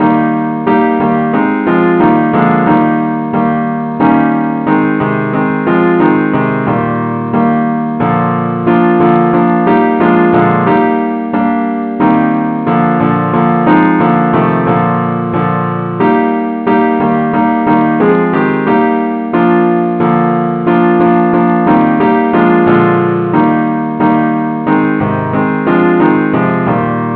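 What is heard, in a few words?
advance accompaniment key ees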